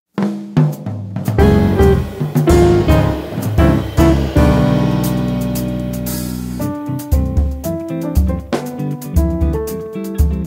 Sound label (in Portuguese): Nostalgia do samba, Quarteto